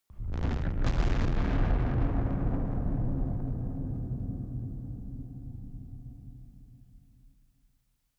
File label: distorted blasts